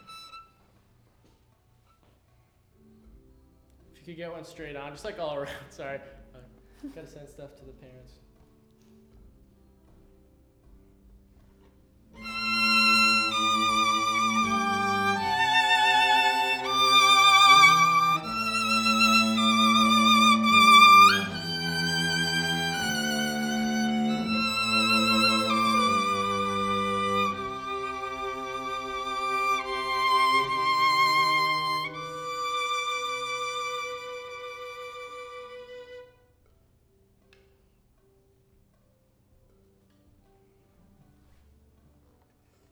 Violin

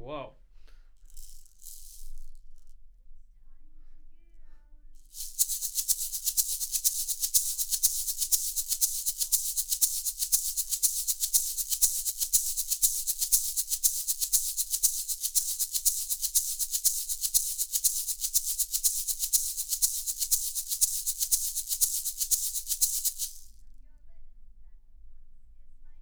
Shaker

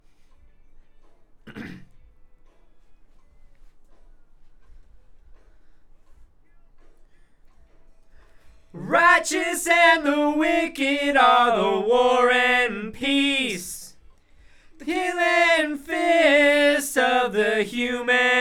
BG Vox

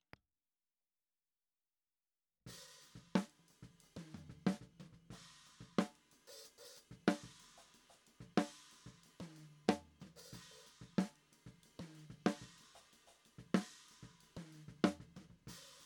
Snare

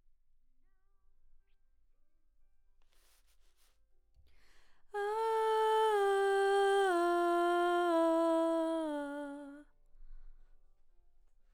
BG Vox

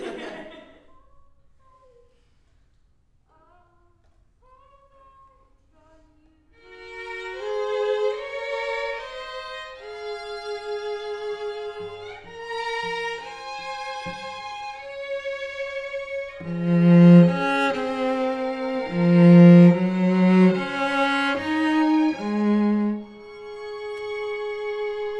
Cello

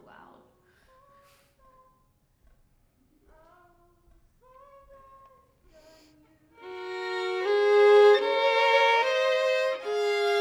violin

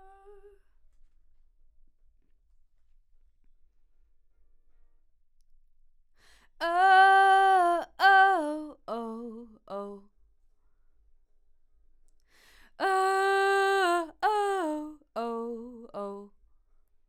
Vox start new

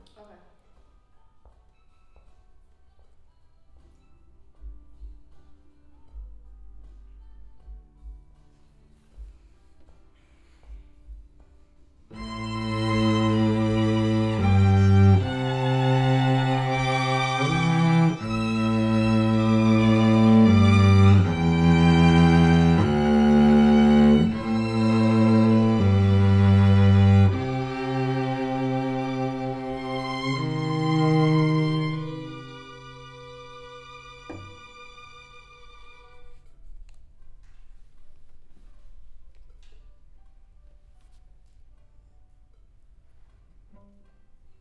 Cello

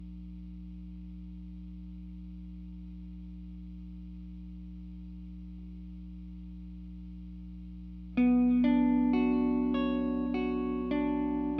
GTR

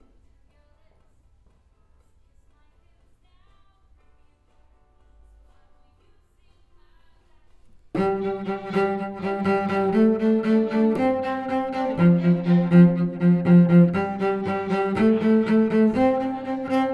Cello

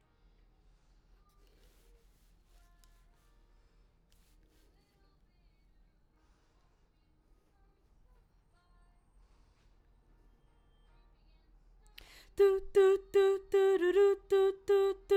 BG Vox

